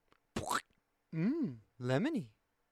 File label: alert